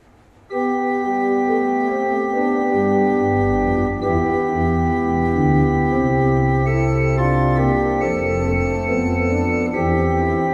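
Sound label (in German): Verschueren 20 II P, pfeifenorgeln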